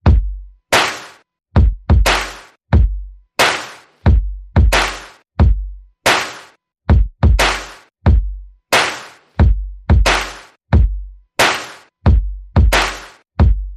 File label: Perc and FX